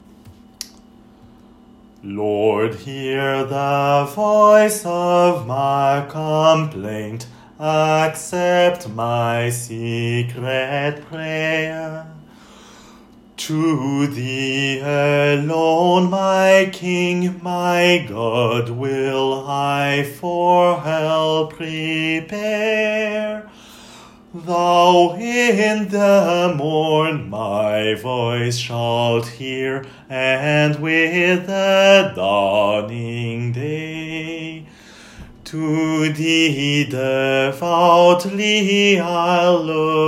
basses lord hear the voice

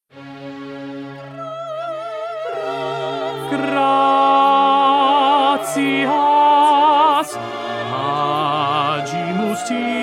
tenor; mozart mass in c minor; gratias

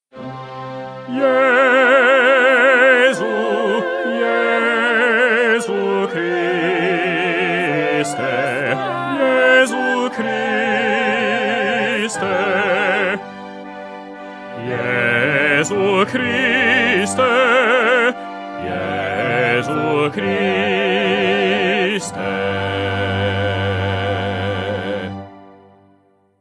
mozart mass in c minor jesu christe bass

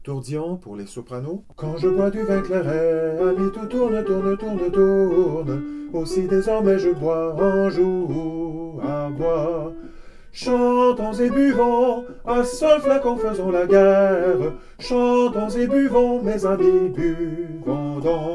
tourdion

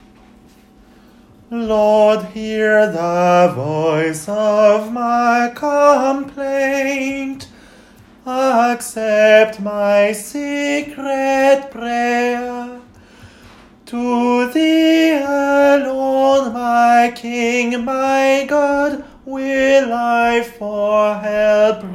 sopran lord hear the voice

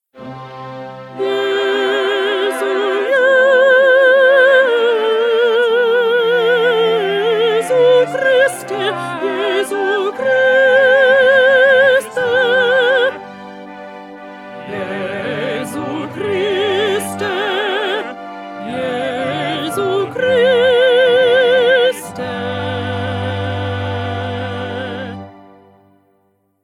mozart mass in c minor jesu christe alto

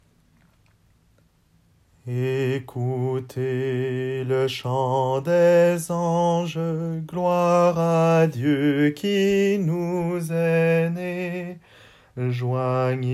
alto; ecoutez le chant des anges

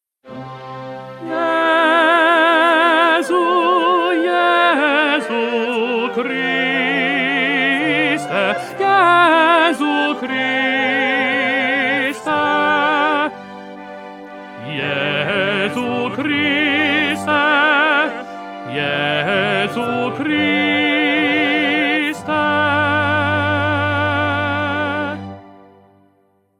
mozart mass in c minor jesu christe tenor

